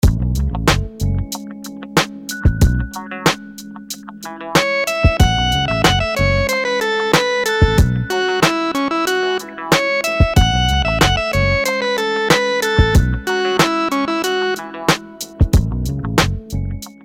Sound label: rhythms dotted 8s